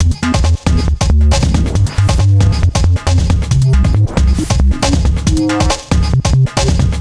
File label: funky wobble